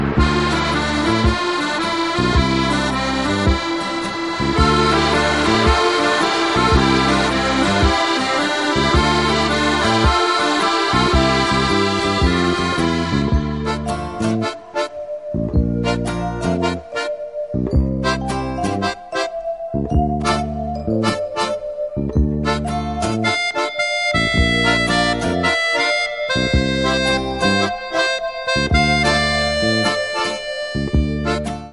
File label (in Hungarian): sima harmonika